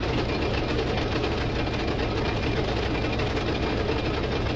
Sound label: loop Combustion; loops; Mindustry